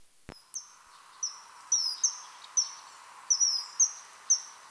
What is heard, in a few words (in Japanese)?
kawasemi